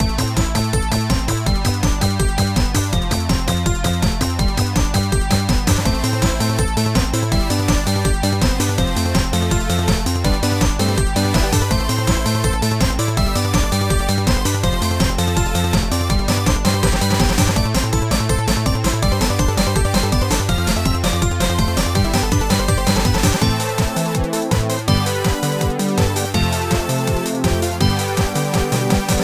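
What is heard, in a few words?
background music minigame fever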